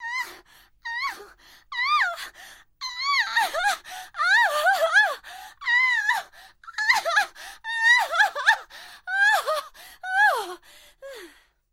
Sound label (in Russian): stony vo vremja orgazma